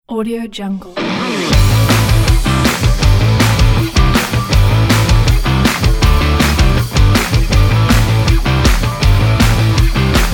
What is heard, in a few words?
power rock logo